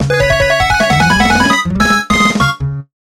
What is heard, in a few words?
Game Over